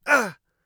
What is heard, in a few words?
hit, Mage Voice Pack